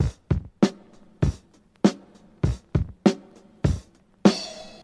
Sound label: Drum loops, Boombap